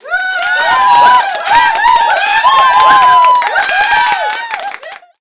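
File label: HAND CLAP + CHEER, SMALL CHEER; 1000fx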